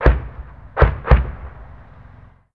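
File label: explosion medium; ModernWarfare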